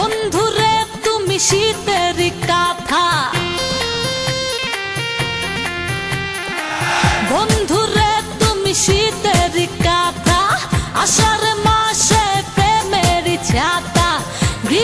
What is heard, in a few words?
Bangla Ring Tone